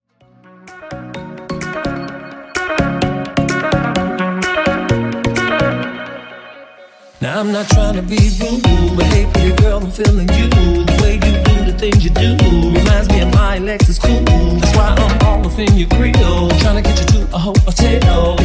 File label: Mashup